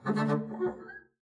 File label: arco noise jumpy, double bass; dsmolken; noises